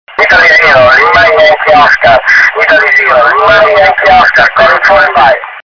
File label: 70210khz; 70mhz